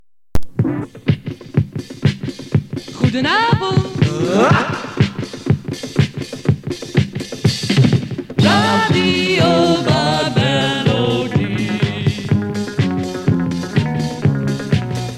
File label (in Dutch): zang promo